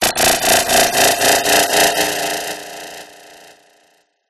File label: sound design frequency modulation stuttering delayed buzz